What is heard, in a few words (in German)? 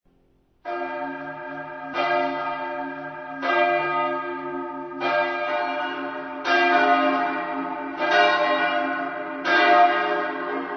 Glocken Stadtpfarrkirche